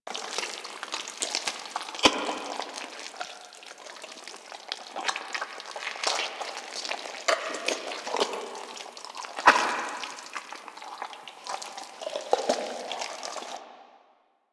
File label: Gore Loop Chunky Medium; Ambience; Props; Warframe; Infested Eris